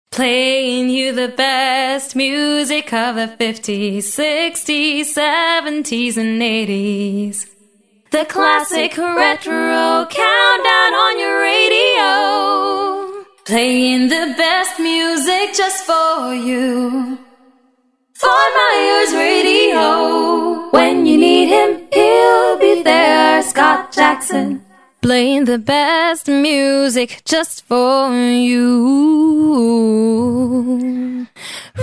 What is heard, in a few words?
accappella sung samples, jingles